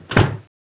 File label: Door; mahouteq